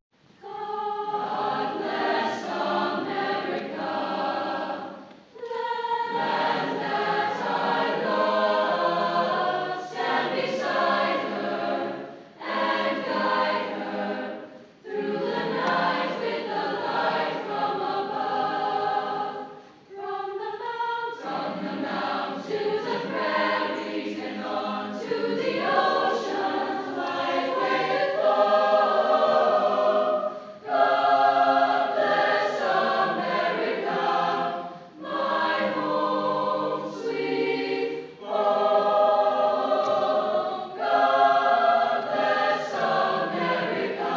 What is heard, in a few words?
Choir; Sequim